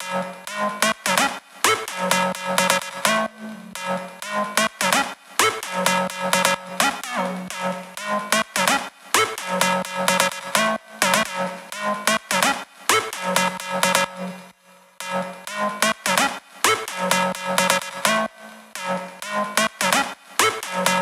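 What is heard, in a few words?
128BPM Synth high Add Wet SC, F# minor; Vengeance - Essential Clubsounds Vol.5; Demo Constr Kits; Let's Work It Kit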